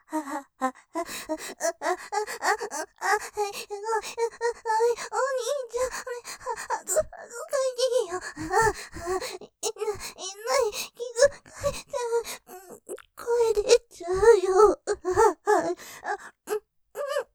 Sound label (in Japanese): 喘ぎ 台詞にならない喘ぎ・とろとろ感; ステレオ